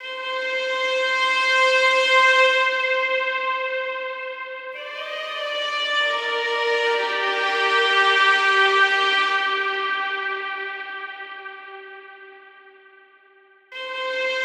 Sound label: High Strings - Cm 70Bpm; Construction Kits; STEMS; Ghosthack; SkyRealm; Kit 19 - Ode To Daenerys